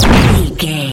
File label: energy blast large